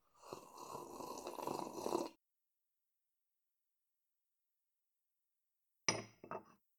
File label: Sipping